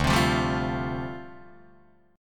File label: D Minor 11th D down Guitar DADGAD